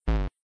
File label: bump